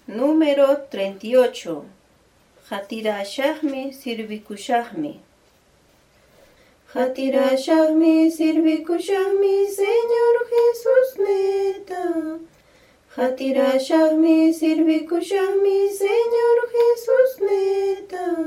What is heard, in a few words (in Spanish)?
cancion